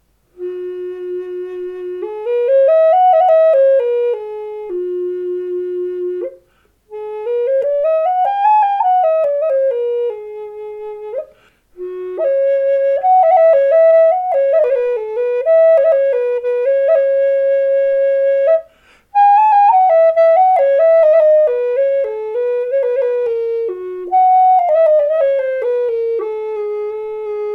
F sharp 4 Spruce, flutes

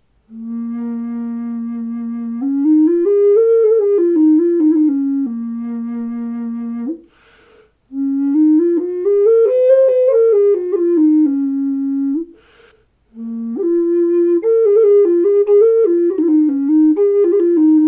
Bb3 mahogany, flutes

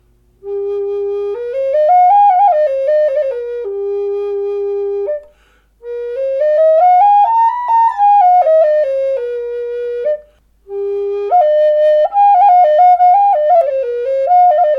Ab4 Heron, Handcrafted wooden Native Style Flute

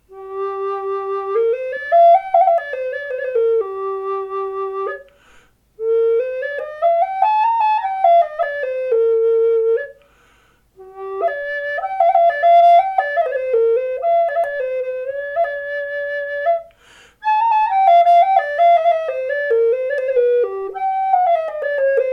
G4 Mahogany, flutes

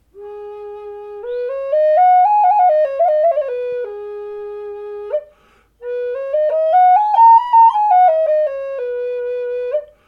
G sharp 4 Spruce, flutes

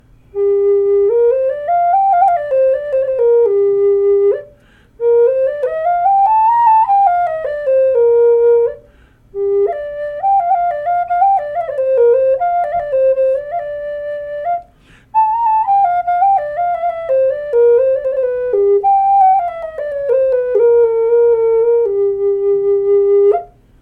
G4, flutes

